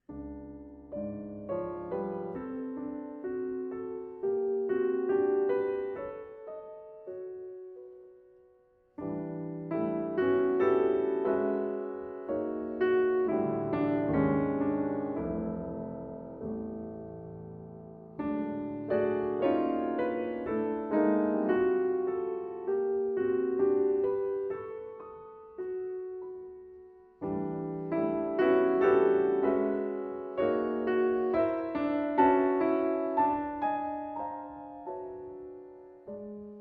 Piano

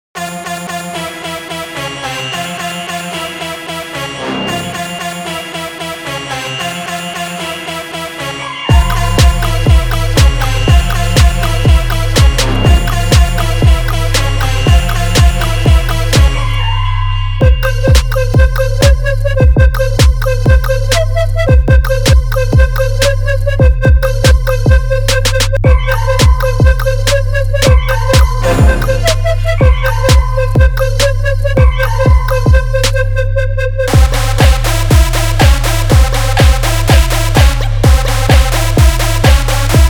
Drum Pads

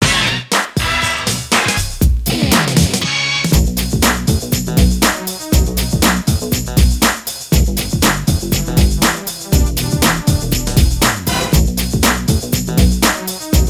Orchestra, Low Strung

